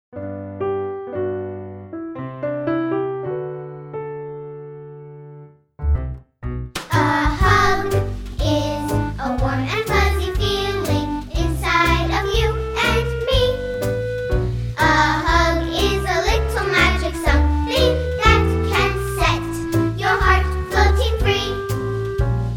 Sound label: a hug vocal, Friendship Song